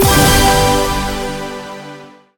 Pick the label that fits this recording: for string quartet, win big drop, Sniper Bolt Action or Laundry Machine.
win big drop